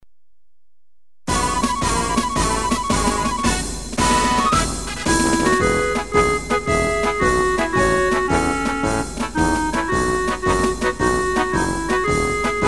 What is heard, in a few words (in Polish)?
Hymn Szkoły